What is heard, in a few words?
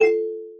plinko neut